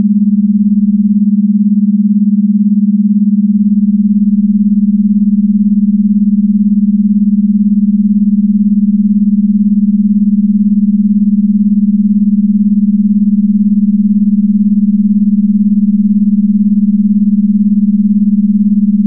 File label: beta 18hz; concentration